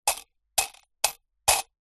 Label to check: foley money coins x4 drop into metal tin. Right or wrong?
Right